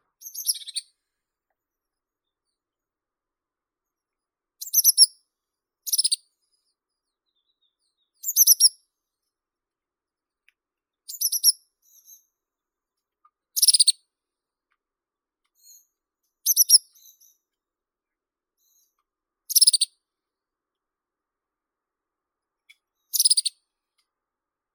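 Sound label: azure tit Cyanistes cyanus, nature sounds; lazorevka belaya; knyazek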